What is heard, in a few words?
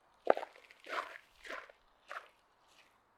Footsteps on gravel